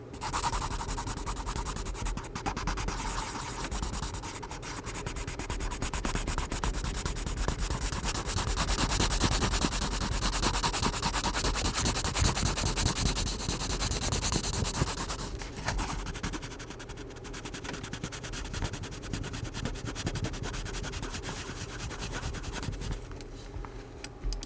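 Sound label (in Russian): звук закрашивания карандашом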